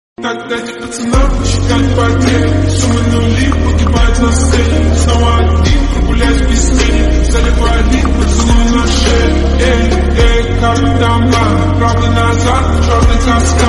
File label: most famous english song tone ringtone